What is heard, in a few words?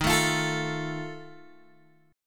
Eb Suspended 2nd Flat 5th, Guitar Open D